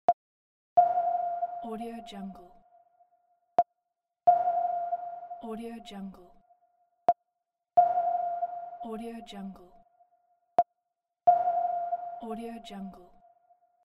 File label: submarine sonar loop